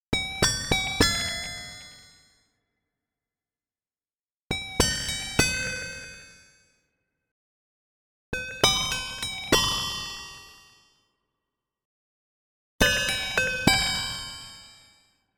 Clicker

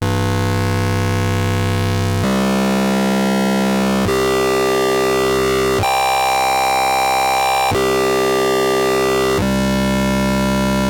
Behringer K2 Ringmodulation VCO Pitch

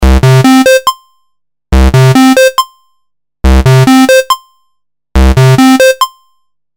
A-110 Pulse

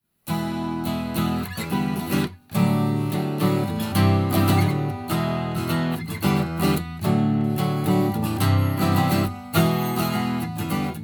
Taylor12 Antelope Edge Note Berlin 184 Emulation

